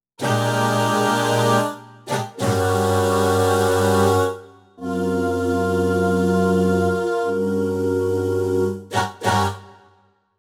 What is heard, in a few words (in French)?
son scats; korg M50 61; workstation